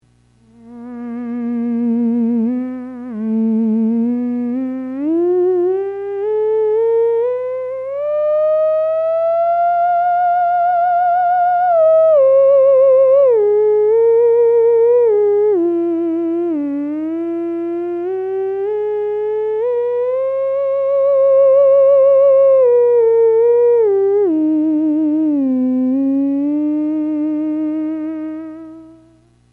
timbre control, theremin

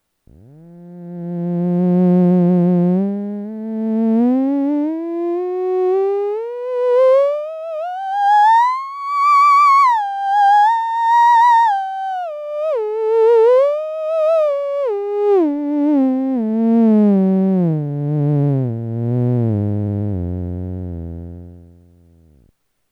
phoenix test, theremin

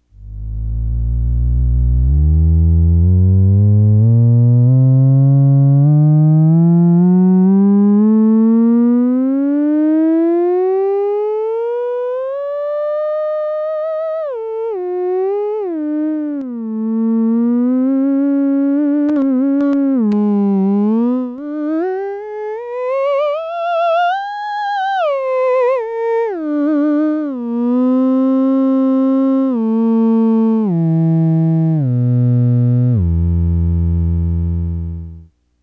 off on, theremin

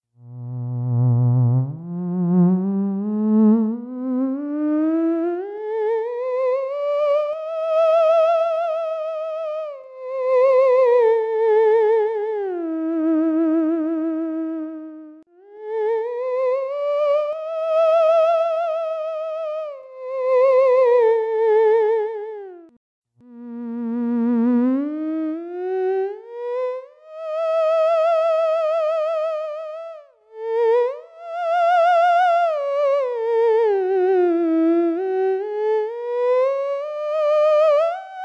smooth, theremin

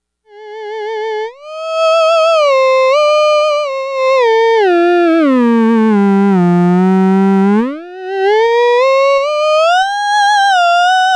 ews new, theremin; ews buffer exciter

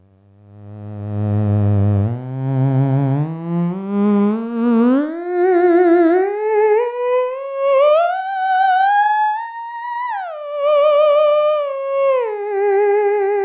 tube ss voice, theremin

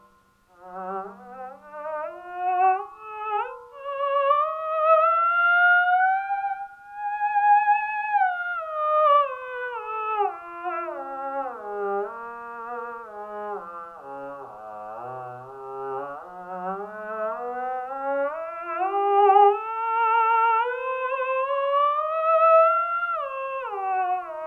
ews resonator, theremin

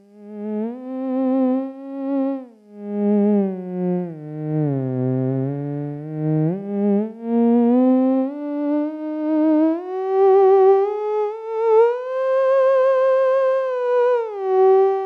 phoenix stereo, theremin

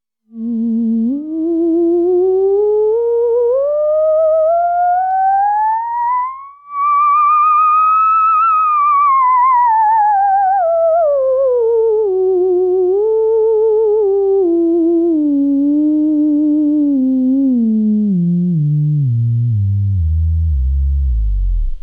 dragonfly sine, theremin

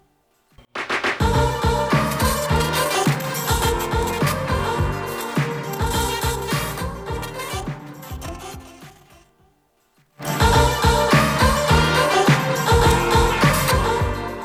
finger-tube, theremin

